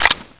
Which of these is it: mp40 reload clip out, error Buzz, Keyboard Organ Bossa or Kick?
mp40 reload clip out